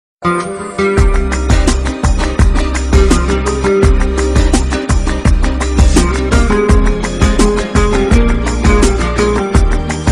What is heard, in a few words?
romantic love ringtone